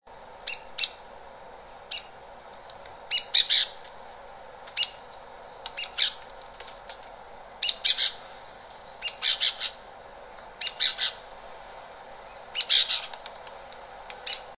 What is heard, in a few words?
Arizona Woodpecker